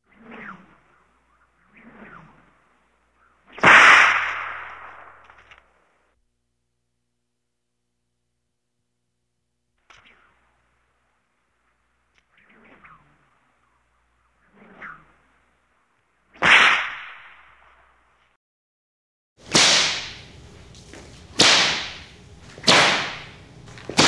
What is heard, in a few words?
Whip Whipping; Halloween Sound Effects